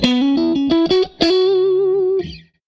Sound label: Strat Guitar Loop